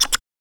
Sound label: Blink; Ed Edd n Eddy; Adromulus Pack